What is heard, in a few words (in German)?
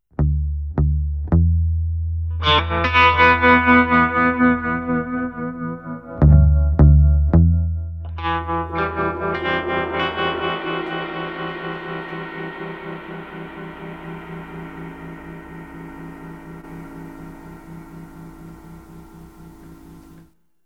atmosphaerisch Drums Gedaempft Bass GT